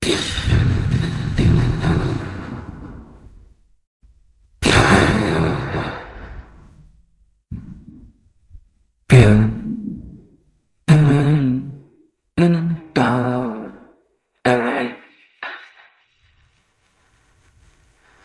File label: jingle